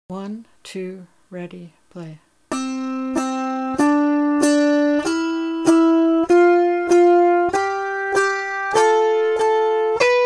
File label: C Scale 2 plucks per note